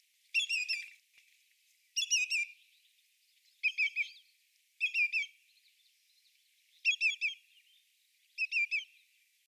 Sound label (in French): grand chevalier